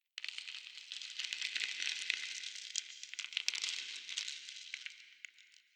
Ambience debris; adams venture origins

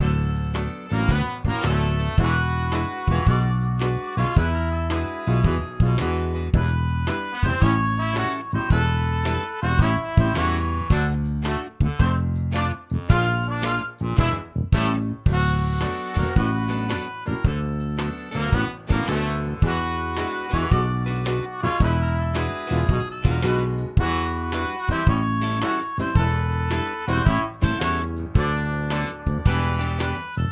ball game

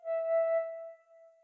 Flute E1; Phoenotopia Awakening

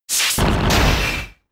Drill Run

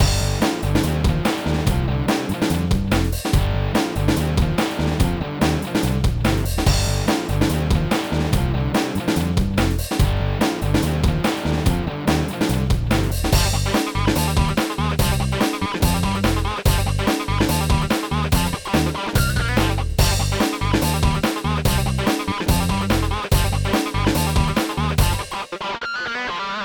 Win; Indoor Sports Club; NDS

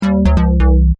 Incorrect; Kahoot

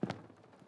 Step; This Is the Police 2

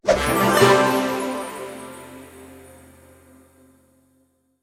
Team Level Up; portal quest android

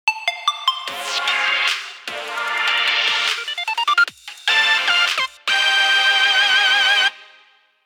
Radio Jingle (Noon); Animal Crossing New Horizons

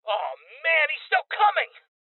Heavy Hit Male; Voices HeavyHit Male

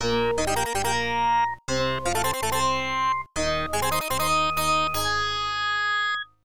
Goal; Blades of Steel; arcade

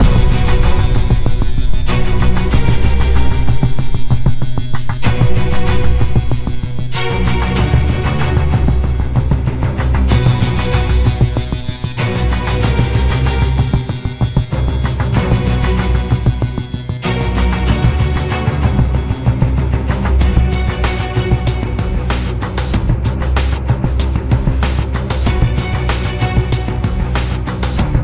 Thunder Hill Low Action; Modern Combat 3 Fallen Nation; gamerip 2011 android ios

